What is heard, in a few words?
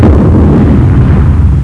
BOOM